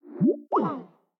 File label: File Transfer Failed; Skype Sounds